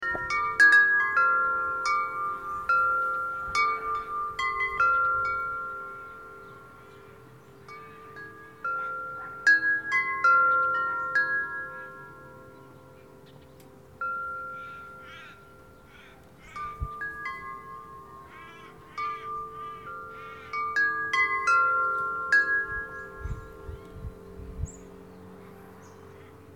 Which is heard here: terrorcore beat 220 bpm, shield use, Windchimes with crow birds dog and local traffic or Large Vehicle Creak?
Windchimes with crow birds dog and local traffic